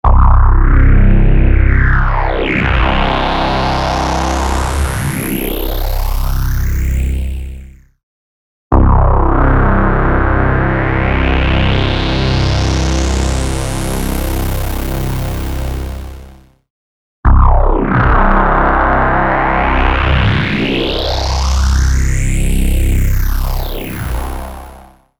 instant phaser mk ii

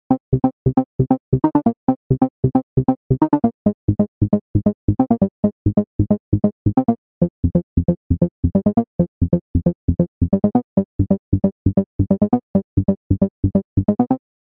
plucked astronomy

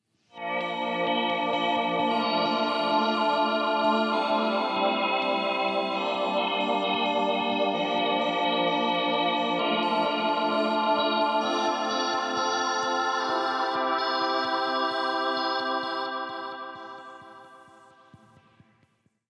pad

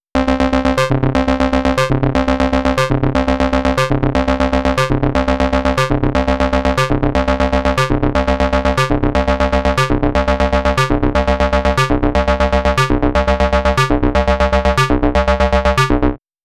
ring modulator

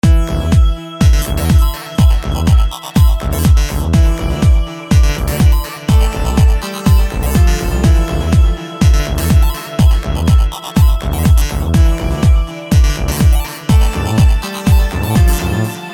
deep house

